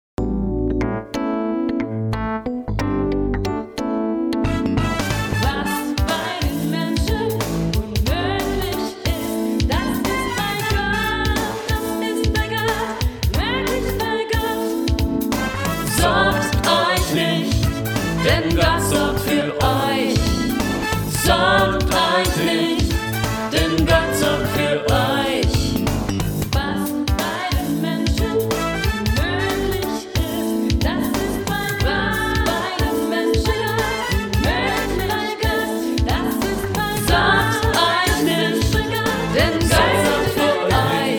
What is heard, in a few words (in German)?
funk